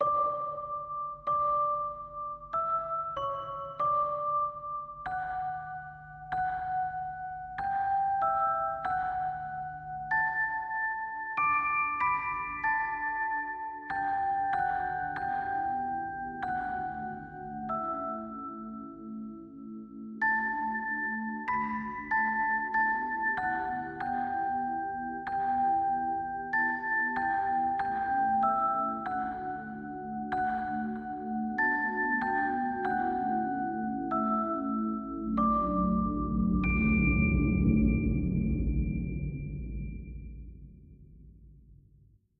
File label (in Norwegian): Deilig Er Jorden (Norwegian Christmas Song Based On Schesien Melody), Verse 1